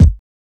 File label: Kicks